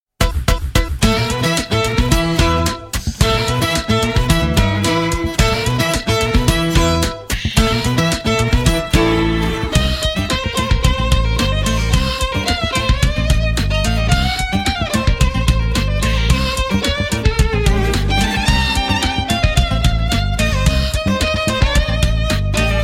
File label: Acoustic Version